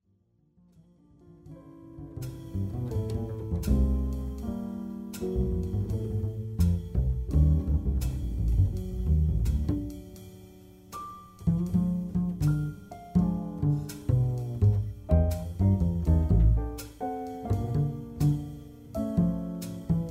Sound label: Piano Jazz Trio